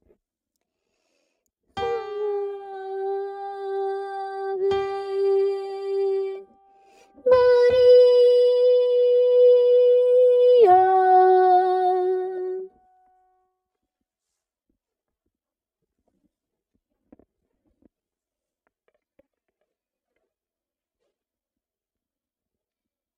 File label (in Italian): ave maria caccini c. alto